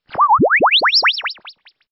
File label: Stinger; STINGERS